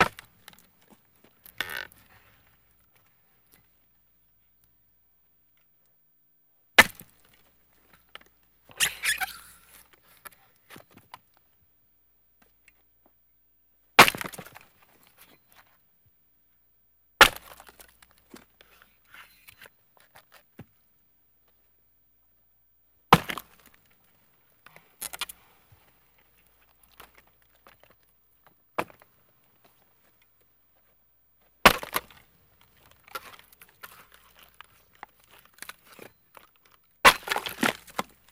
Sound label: Logs Splitting Ax, axe